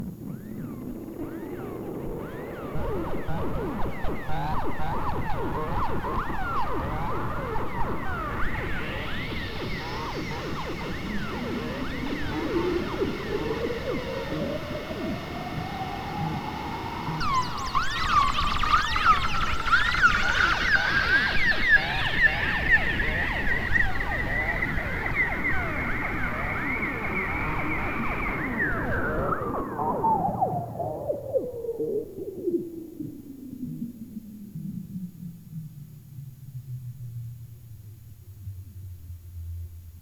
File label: brief chirps, synth, songs of insects